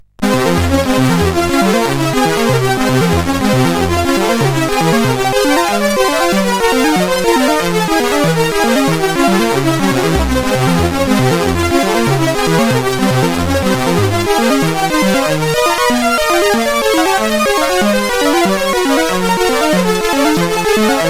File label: Atari ST sid CSG8580R5; Colossus Chess